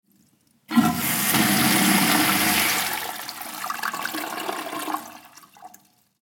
WC Spuelung

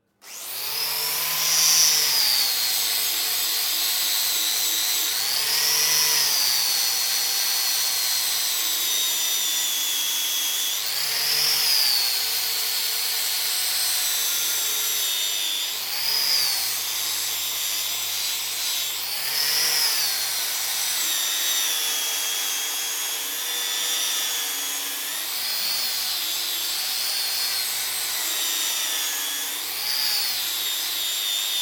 Polieren

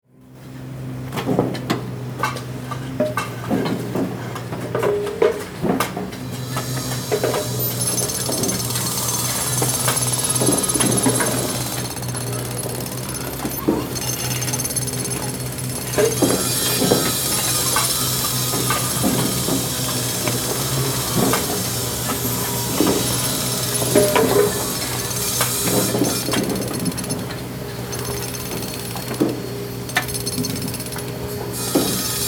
bagger

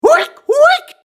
vox mann huick huick

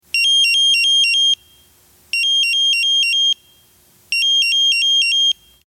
Pieper

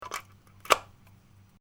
Schalter

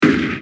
schuss

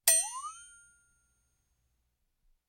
Instrumente Flexaton Glisando Aufwaerts

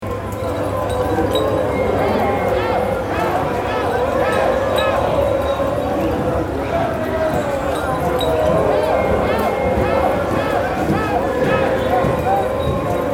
Flasche Gegroele

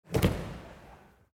Judo Wuerfe

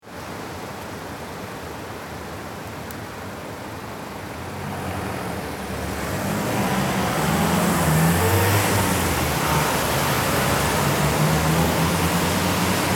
Verkehr an der Ampel

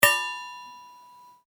Metall

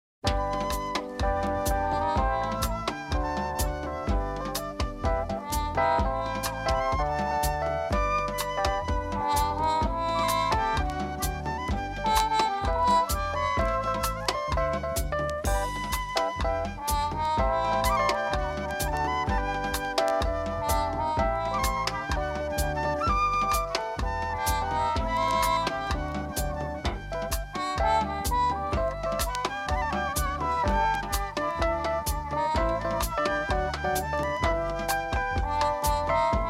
Tanzrythmen Rumba

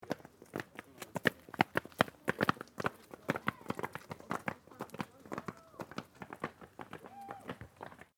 Fussball Schritte

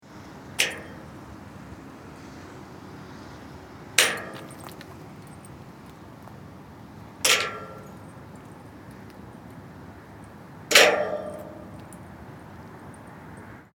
Landschaft Kette schlaegt

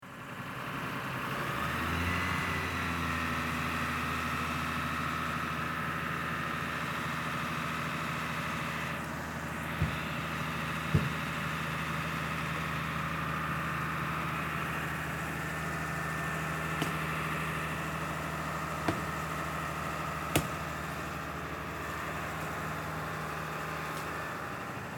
Silvester Feuerwehr

